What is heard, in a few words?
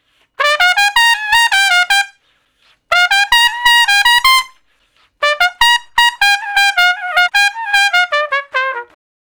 Trumpet Shuffle (G); Solos